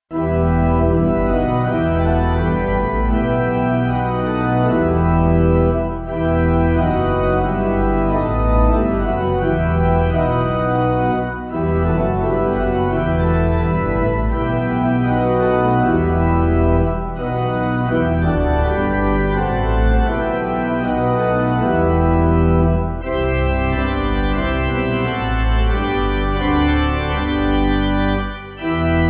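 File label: Pipe Organ, Music Backing Files for Churches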